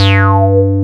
Audity Bass G2; Digital Sound Factory Xtreme Lead

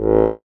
DIATON BAS, AKKORDEON, Alpine Volksmusik; Best Service

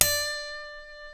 E, funk guitars

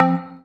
DM BAS, Dance Mega Synth

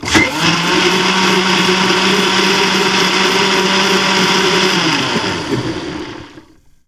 BLENDER RU, Kitchen Counter; E-MU Producer Series Vol. 2; More Studio Essentials

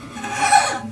SQUEEK, Nylon Guitar

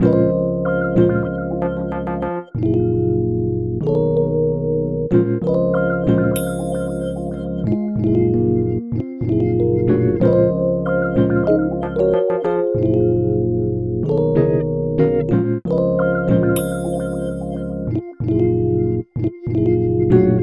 Melody, Hip-Hop

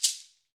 Ahfuche; Club-50 - Foundations Roland; Ahfuches

